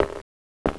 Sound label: walk r